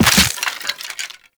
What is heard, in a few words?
Land Soft Bone, Footsteps; Characters; Operator